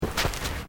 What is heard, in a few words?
FOOTSTEPS TABI TATAMI MATS STEP; Footstep Foley; Footsteps (Sound Effects:Human)